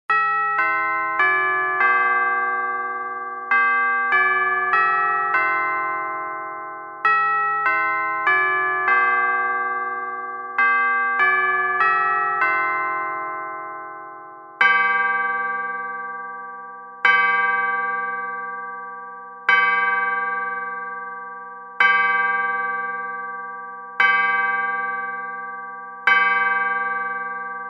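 household clock mantle no tick tock strike 12 bell westminster chime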